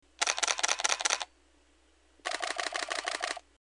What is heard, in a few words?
shutter; 40D; digital photography